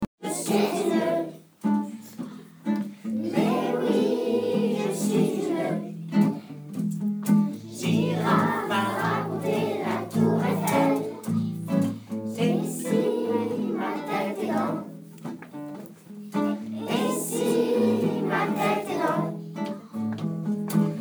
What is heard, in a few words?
Tour eiffel blues